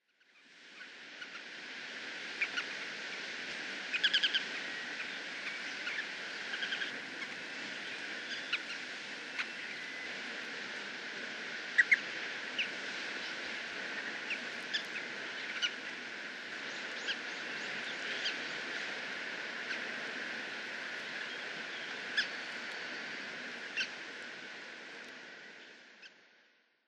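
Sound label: Ruddy Turnstone; kyojoshigi; hahajima; koe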